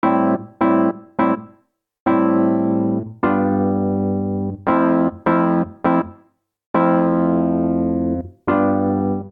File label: Progression AOR Rock i - VII - VI A Minor 103 bpm, Electric Keyboards; Ableton; Factory Packs